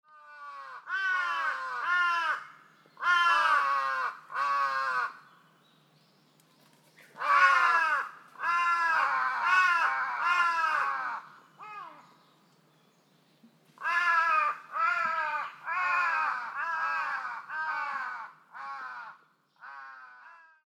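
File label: Large-billed Crow; atami; koe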